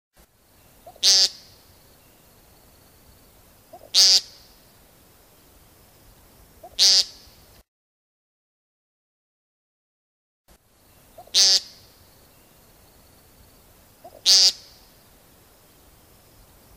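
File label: American Woodcock peent and flight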